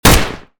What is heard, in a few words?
l86a1 fire; STALKER